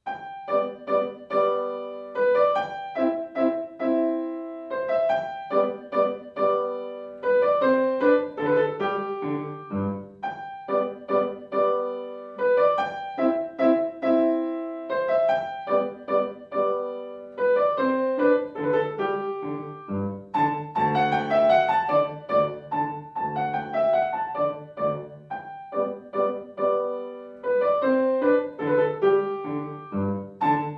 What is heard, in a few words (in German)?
Menuett G-Dur; L. Mozart